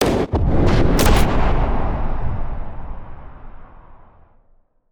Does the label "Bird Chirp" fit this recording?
No